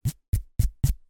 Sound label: human filing nails